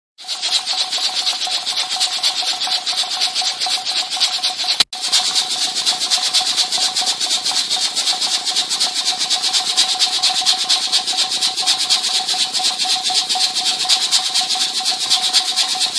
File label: Jiggle